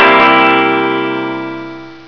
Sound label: Guitar; Sound Library 2000; Wayzata Technology